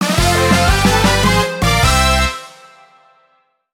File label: Music event; Power Pro Kun Pocket R